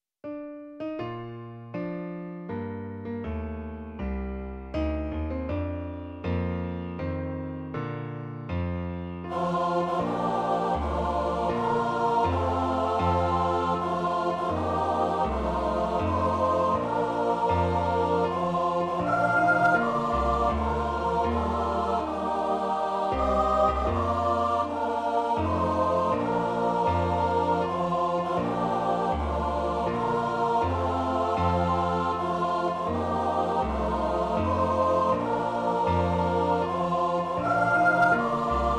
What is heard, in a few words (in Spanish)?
coro happy birthday